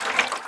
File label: throwable slosh; breadmonster